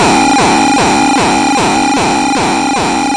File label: 151 dangerously hard electricity, drum loop; 141 to 160 bpm